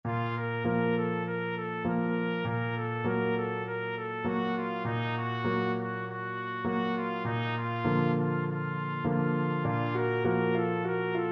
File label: trumpet